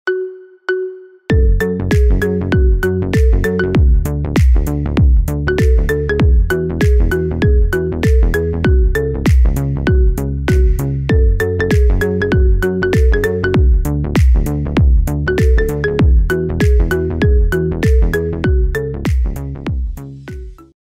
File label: Marimba Cover